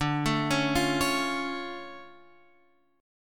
D Minor Major 7th D down Guitar Open F; DmM7 arpeggiated; JGuitar

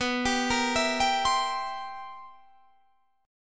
C 7th B, C7/B chord; arpeggiated

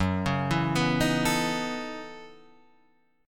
F sharp Minor 13th, Guitar, Standard; arpeggiated; JGuitar

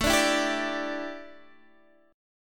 A 7th Suspended 4th Guitar Open D, chord

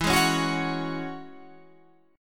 Ab 7th Suspended 4th Sharp 5th, E

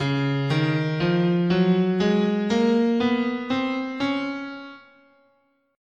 Db Bebop Dominant, scale

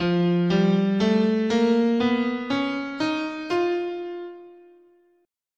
F Major Locrian, scale

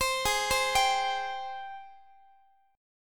G sharp Major 7th, Guitar; arpeggiated; x,x,15,18,16,18; 4A,4E,5A,5D,5G#,6C#; G#M7

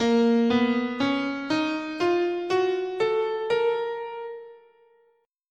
Bb Neapolitan Minor, scale

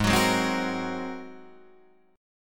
G sharp 9th, Guitar, Open D

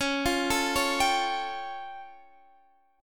A Augmented 7th C sharp

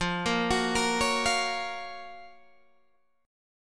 Gb Major 7th F, guitar

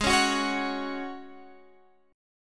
F sharp Suspended 2nd, G sharp, guitar chord

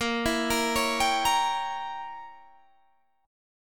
C sharp Diminished 7th A sharp

